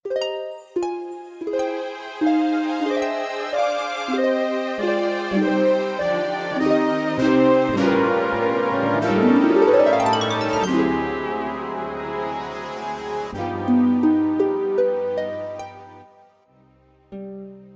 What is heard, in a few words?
SLOW BALLAD